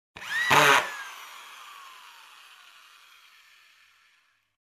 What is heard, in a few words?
Electric Hand Driller Quick Drill